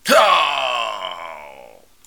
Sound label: death; metal bullets; player